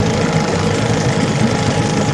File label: 50 hp Evinrude Boat Idle Loop